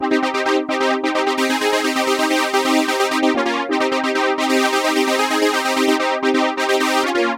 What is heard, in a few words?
techno